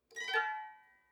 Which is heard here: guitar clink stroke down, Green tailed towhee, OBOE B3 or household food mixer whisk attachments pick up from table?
guitar clink stroke down